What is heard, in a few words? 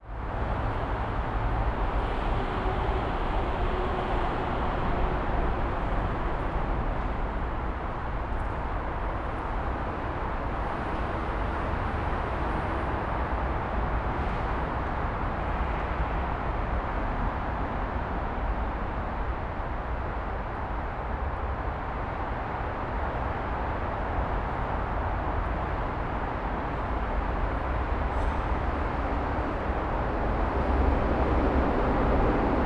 Ambience, Urban, Under; City Sounds